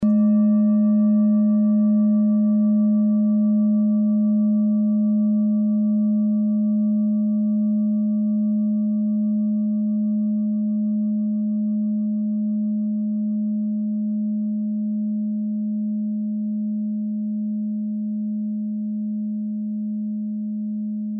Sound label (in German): klangschale ladakh